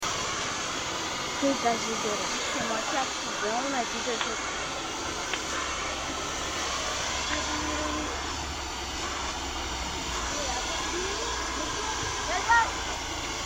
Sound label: brushing